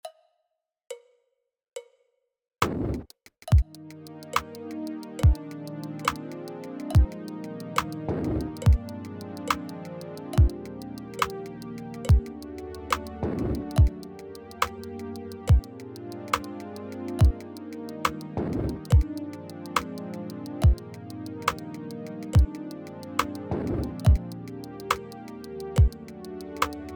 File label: click